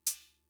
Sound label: Cymbal; Fricke MFB-522